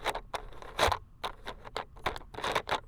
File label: Plastic Caster; BB Retail Therapy Sample Pack